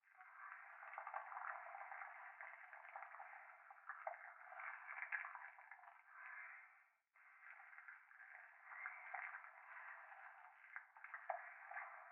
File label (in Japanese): 風呂 右耳密着吐息 触手